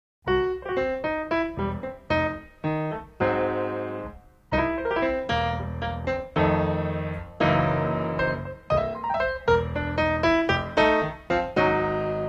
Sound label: Blues Man